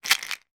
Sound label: household vitamin tablets shake inside container